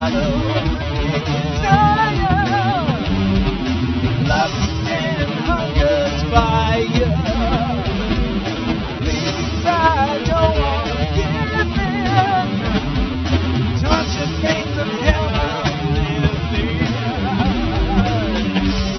harmonic, Phase based harmonic percussive separation